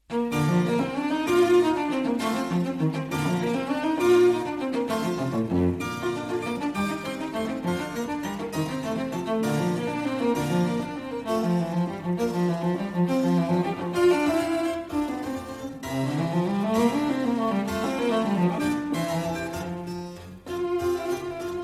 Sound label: Cello Sonata in B-Flat Major, Allegro; Vivaldi; Cello Sonata No. 1